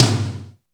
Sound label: TOM MID; Back In Time Records; Big Bang